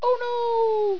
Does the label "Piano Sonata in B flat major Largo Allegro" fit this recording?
No